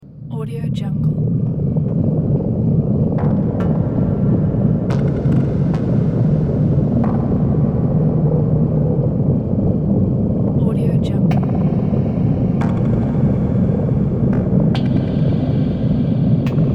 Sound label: futuristic ambience